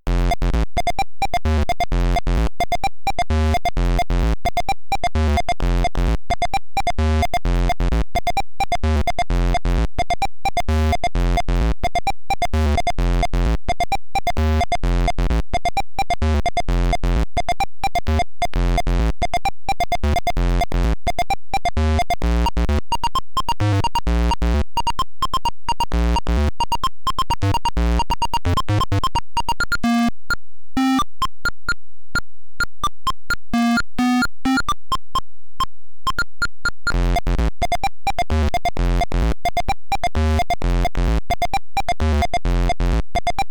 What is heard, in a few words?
Rocket Bar Music; Space Quest 1 VGA; DOS; Blues Brothers